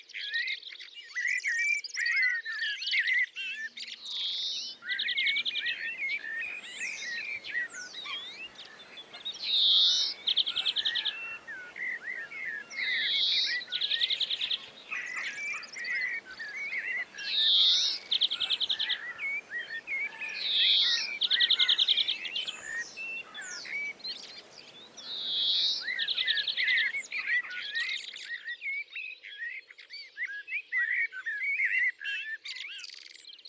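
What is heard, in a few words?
greenfinch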